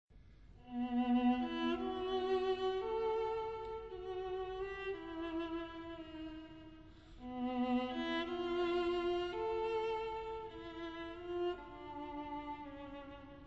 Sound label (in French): Quatuor la